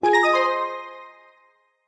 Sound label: menu play click; cedru vax amas